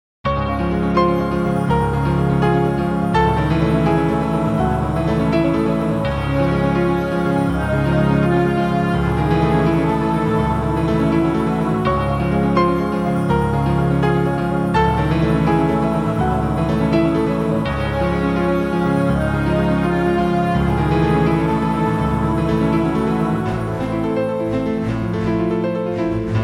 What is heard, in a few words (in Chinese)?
纯音乐背景音乐